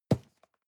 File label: cardboard box medium grab hard; objekte